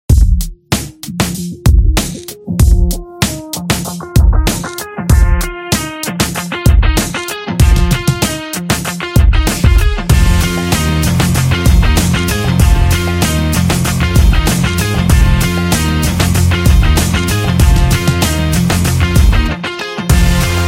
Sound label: Hip Hop Action Rock